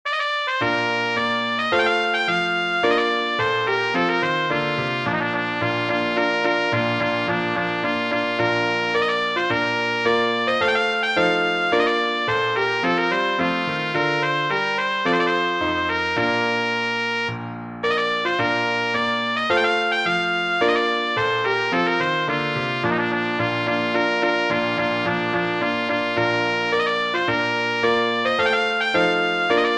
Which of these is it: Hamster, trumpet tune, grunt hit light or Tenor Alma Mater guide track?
trumpet tune